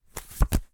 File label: leisure playing card on top of deck turn over